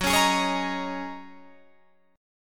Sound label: F sharp 6th Add 9th F sharp Guitar Drop D